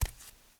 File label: Step concrete barefoot